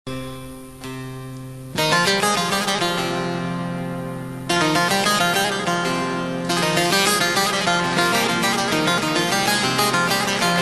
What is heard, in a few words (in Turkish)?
bağlama